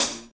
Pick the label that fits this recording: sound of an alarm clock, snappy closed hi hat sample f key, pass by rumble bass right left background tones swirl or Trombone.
snappy closed hi hat sample f key